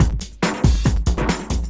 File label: Loops BB 140, BreakBeats; Vengeance Samples